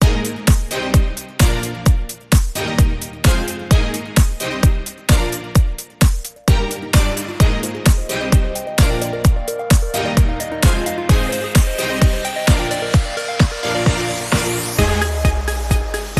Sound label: Menu; My Little Pony Magic Princess; Equestria Girls